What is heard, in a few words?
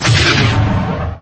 starfighter fire; naboo; lego star wars